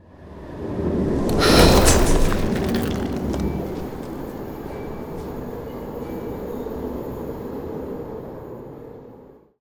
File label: Ice Spell Whoosh, Cold Whooshes; Arctic Aura; Zephyr